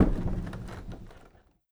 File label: door large wood movement stop; Rust